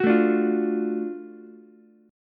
F sharp Minor Major 7th Sharp 5th F sharp down Ukulele; strummed